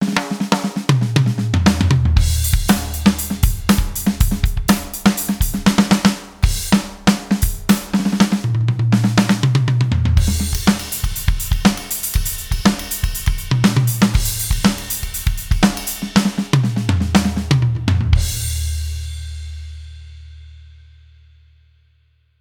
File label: Kit Test, alesis strata club; Combo Kit 3